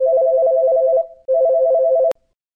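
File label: Uk Cellular, British cellular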